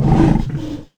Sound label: breath, strogg; Quake 4 Alpha; strogg tik